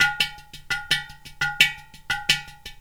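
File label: percussion udu; Perc.Loops; Af.Prc.Loops; Roland LCDP14 Africa VOL-2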